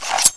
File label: knife deploy, weapons; garrysmod; knife fas